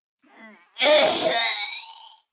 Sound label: Vomit Gag, Choking, vomit, 1 child, short, intense; human voice, kid; Vomiting Sound Effects